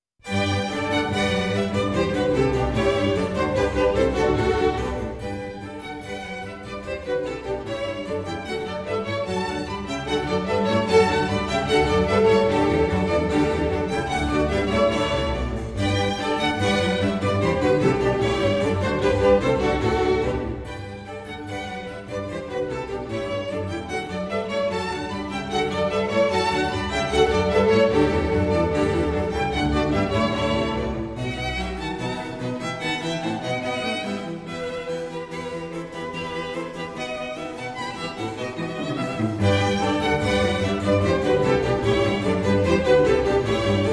Concert For Strings & Harpsichord In G Major (Alla Rustica) - Allegro; Vivaldi